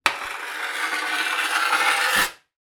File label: metal scrape plate or panel; zapsplat